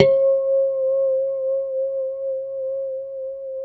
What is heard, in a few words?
D5 pick harmonics, guitars; Spectrasonics; Hans Zimmer Guitars VOL-1; JC-HARMONICS